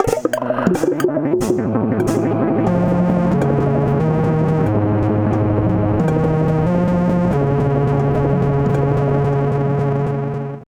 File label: Carillon